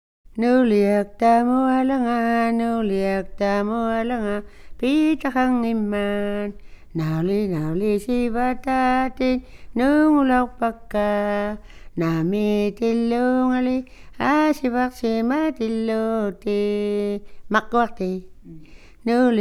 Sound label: Play Song Wife Give Me Some Bread, INUIT